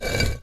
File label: Rotate